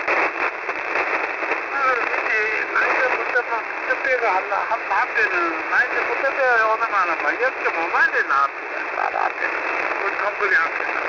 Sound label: usb